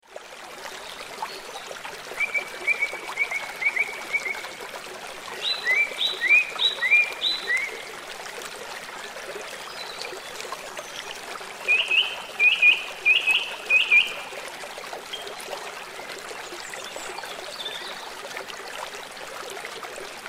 Waterfall river creek bird chirps in foreground

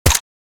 Tactical assault rifle suppressed shot; gfxsounds

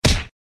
Heavy body hit

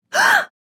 Female shocked gasp

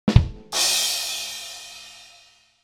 Comedy drum riff

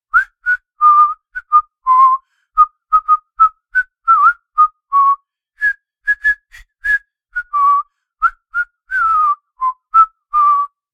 Human whistling a song whistle song

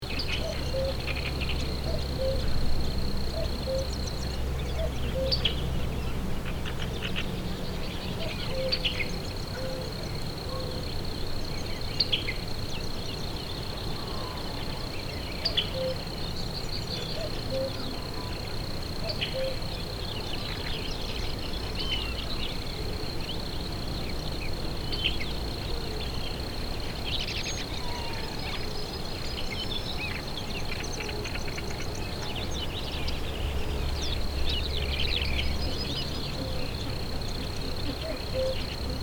Countryside farm birds ambience loop

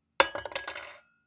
Kitchen utensils drop clatter rummage

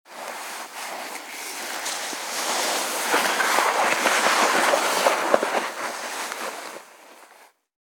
Snake slithering movement indoor

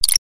Sci-fi glitch futuristic rapid

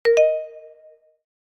Positive twinkle accent

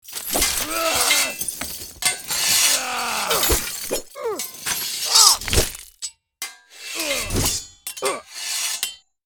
Knight duel sword clashes hits and battle cries

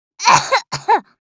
Little girl heavy cough; gfxsounds

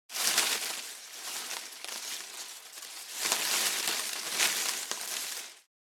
Bush leaves foliage movement

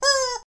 Squeaky chicken

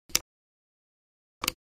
Mouse drag and drop click

